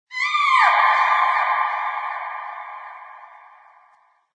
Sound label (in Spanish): Sonido eco grito